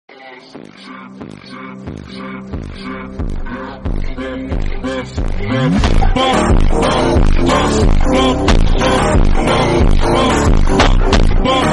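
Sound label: background music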